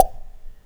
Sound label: pickup